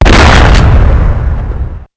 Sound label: Remington Pump shotgun